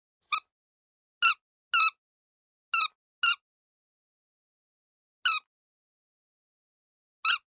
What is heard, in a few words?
Duck Toy